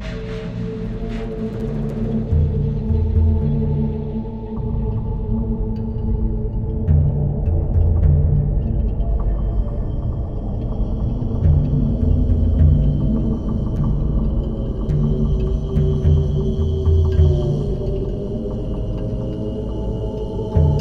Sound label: Mayan Ruins Build Up Low, gamerip